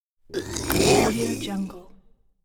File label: halloween monster